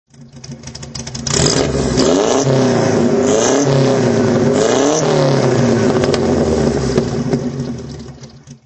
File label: morgan4 4 throt